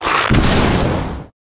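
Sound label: Rat buff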